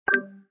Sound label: action window close; Samsung Galaxy S10; Velvet